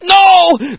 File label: scientist pain; Source Engine; bshift